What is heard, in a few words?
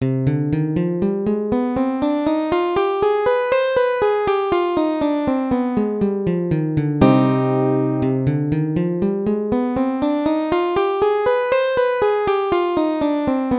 cm harmonic minor scale